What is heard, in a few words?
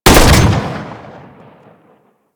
Fire, Weapons; Strun